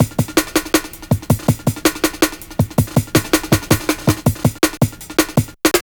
Rolling, Drum Loops, Jungle, 162; Jungle Warfare 1&2

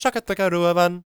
GAELIC, World Voices; ILIO; Vocal Planet

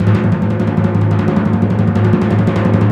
PRC TIMPRO, Timp Roll; Roland LCDP03; Timp Rol Dry

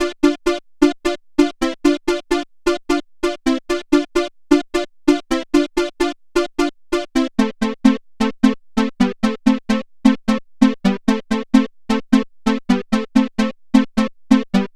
Chord Stabs, EDM; Sonic Foundry (Sony Creative Software); Afterhours EDM

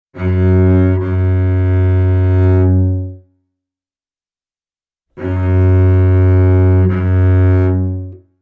Celtic Cello; Sonic Foundry (Sony Creative Software); Continental Drift Aftershocks

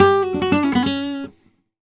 Guitar Picado, Flamenco, f#; Best Service ProSamples vol.46 - Flamenco

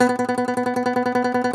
DOMRA, Plucked Strings, Segundo Domra; E-MU Producer Series Vol. 7 – Old World Instruments

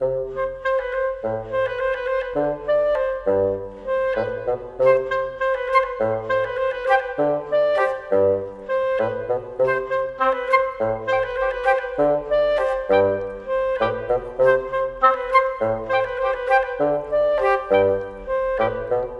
Cinematic Winds; Sonic Foundry (Sony Creative Software); Orchestral Series VOL-3 - Cinematic

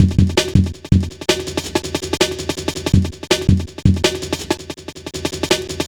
Funki, Jungle, Drum Loops 163.5

